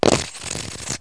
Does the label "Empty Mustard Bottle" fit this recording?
Yes